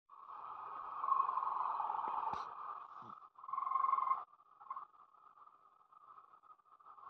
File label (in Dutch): wasbeer